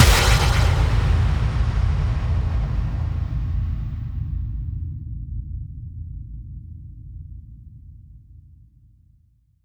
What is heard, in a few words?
FX Kicks; Vengeance - Essential Clubsounds Vol.4; Effects